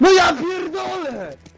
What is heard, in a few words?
bleh rage